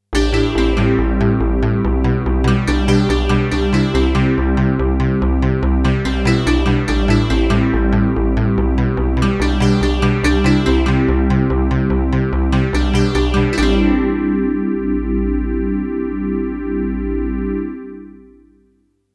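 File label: Yamaha DX7II dry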